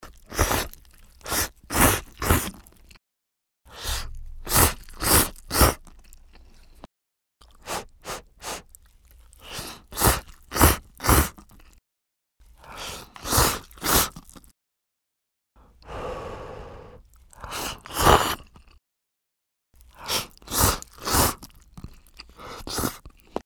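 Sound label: ramen slurping; susuru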